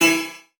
Multimedia Internet CD-Rom Flash Ding

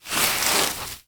Slide Into Base; Sports

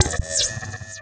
Multimedia Internet CD-Rom Flash Hi-Tech

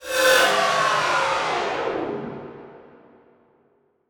Title Transition Feedback; Production Element

